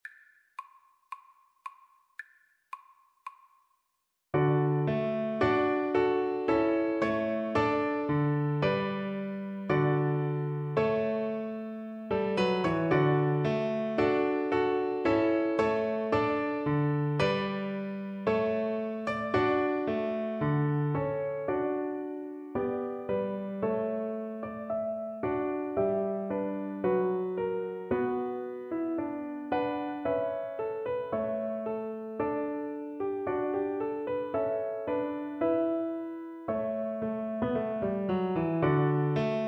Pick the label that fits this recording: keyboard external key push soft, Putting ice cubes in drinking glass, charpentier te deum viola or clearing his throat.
charpentier te deum viola